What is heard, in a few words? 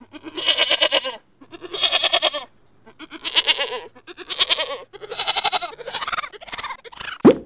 goat